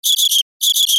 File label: ANIMALS